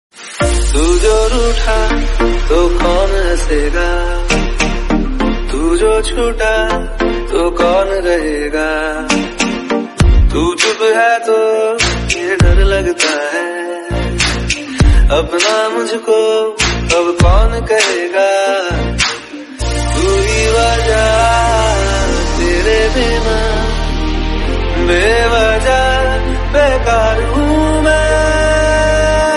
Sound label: Brother Birthday Song